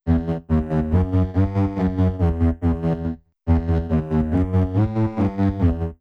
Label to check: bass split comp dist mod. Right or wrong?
Right